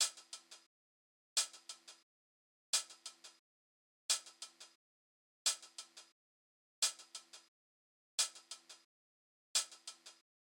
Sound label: 88bpm Hat Loop